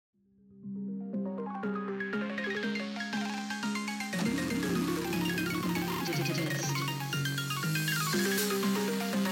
Cowbell, phonk music

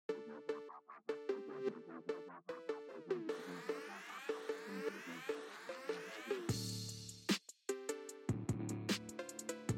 Dark Phonk

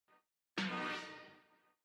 Clown Sound

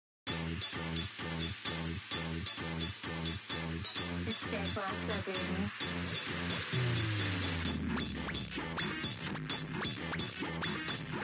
Phonk

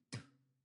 Gargle Spit

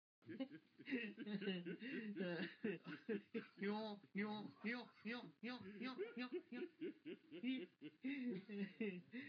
Old Man Laughing

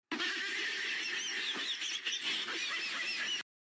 Dog Laughing Meme; Blue Lock Rivals

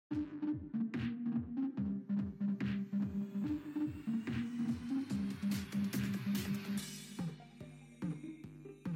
Robo Phonk

